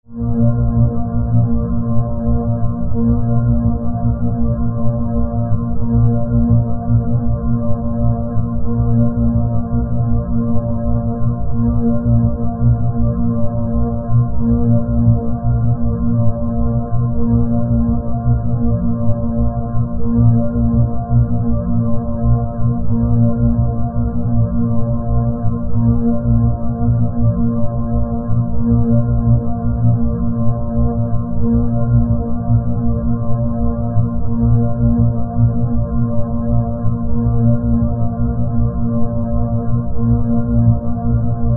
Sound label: electrical synth